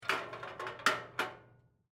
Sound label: Medical Scale, Emergency, Medical, Scale, Move, Weight; Hospitals